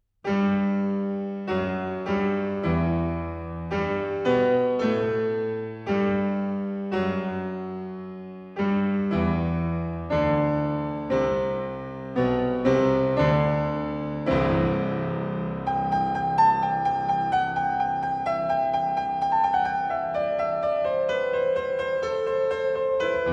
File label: Prelude in G major, Piano